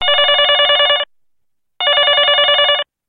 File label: Ring Tone